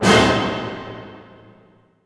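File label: A diminished fortissimo, orchestra hits; Sound On Sound - Peter Siedleczek's Orchestra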